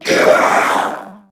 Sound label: Death; Warframe; Corpus Amalgam Sniper; Dialog Barks Battle